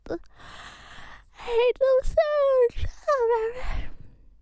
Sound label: Yawn